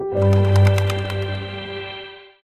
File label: Soulmate Heal; smite 2; Aphrodite